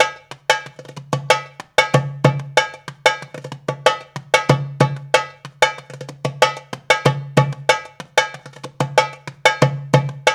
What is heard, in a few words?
Dara Dance, Darbuka; Spectrasonics; Supreme Beats; World Dance